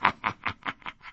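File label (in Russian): жуткий смех одиночный мужской близкий мягкий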